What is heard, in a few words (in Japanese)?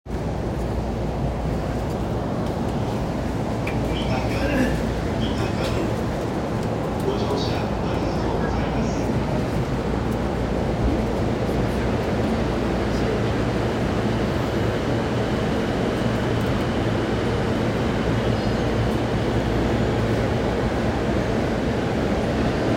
JR中央線快速三鷹東